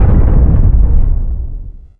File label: land rumble